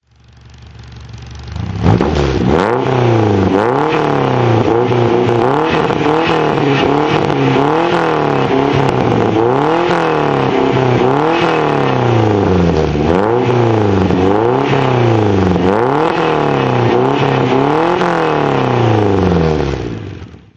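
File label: peugeot 504 rally throttle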